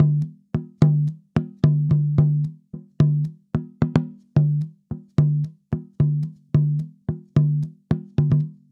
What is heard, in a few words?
Tambora, African; Sonic Foundry (Sony Creative Software); Continental Drift Aftershocks